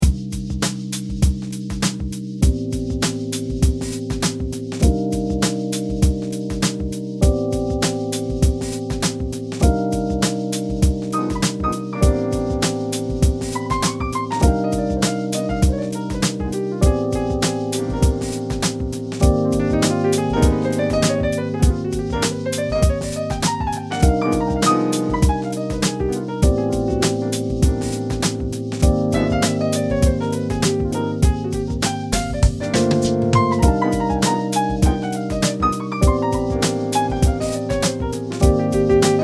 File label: Jazz